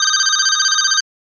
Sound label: 80s Phone, ringtones; Samsung; Galaxy Watch 8